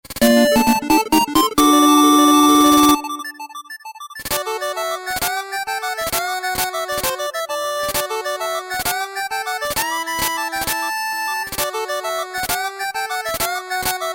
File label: Treasure Room 8-Bit